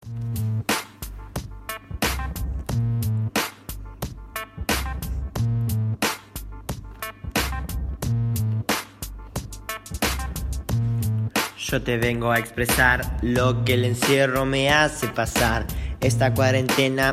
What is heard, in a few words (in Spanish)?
hip hop; Muestra virtual de estudiantes de 4° año